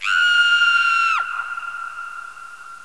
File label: scream